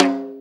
Snare, Snare Drum; Drum Tools

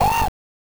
Voice Scream; Sonic 3; M8; Genesis

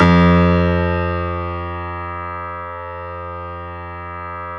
Stereo Grand, Keyboards; E-MU Producer Series Vol. 2 – More Studio Essentials

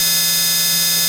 Short Circuit; Sonic Foundry (Sony Creative Software); Loop Noir

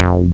SH101 mid bass; Urban Shakedown

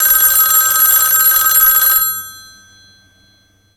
PHONE RING; Greytsounds; HOUSEHOLD FX

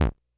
Bleep, Korg DS-10

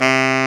SAX TENOR, Super Sax Tenor mf

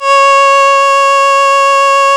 C#, strings; Big Fish Audio

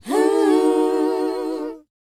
WHOA C#, Vocal R&B & Dance; ILIO; Vocal Planet

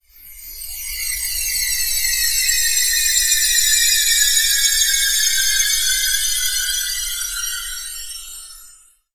Flyer; Zero-G Seismic Frequencies; Flyers

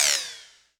Percs, Elektron MachineDrum SPS1 MKII

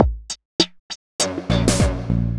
dark beat w bass; Sonic Foundry (Sony Creative Software); Ugly Remnants VOL-2

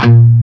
CHUG A#1, Guitar; CHUGS; East West; Steve Stevens Guitar Samples Collection

